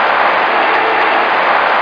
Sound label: cheer; WCWDEMO